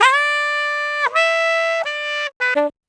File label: Sax 100 bpm G, Saxaphone; Quazibeat; African Secret